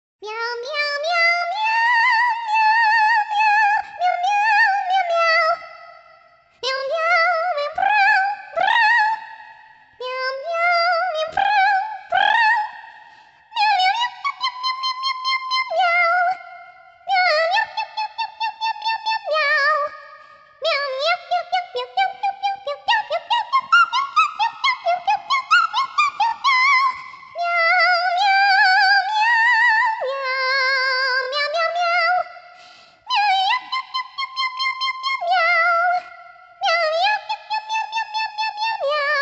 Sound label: Magic Flute Voc; Purr Pals; 2007 nds